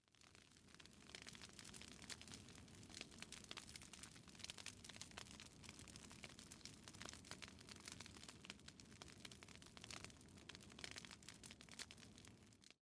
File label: Fire; Age of Empires III; cinematics